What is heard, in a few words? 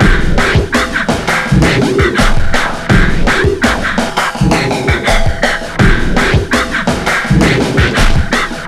WEIRD BEAT 83 bpm, Breaks; Planet of the Breaks